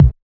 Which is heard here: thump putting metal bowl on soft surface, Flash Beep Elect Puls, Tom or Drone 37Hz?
Tom